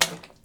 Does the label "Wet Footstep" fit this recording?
Yes